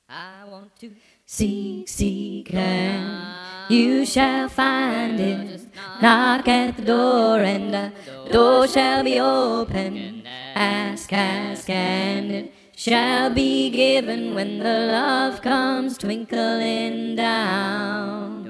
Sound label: tenor